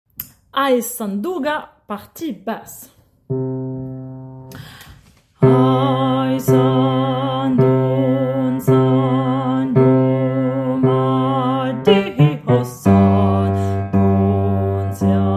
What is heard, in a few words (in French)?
Basse